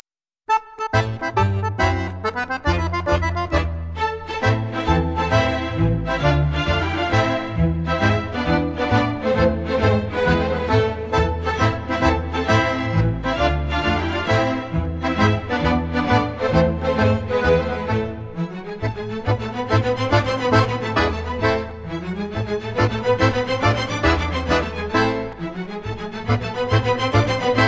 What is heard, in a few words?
tarantella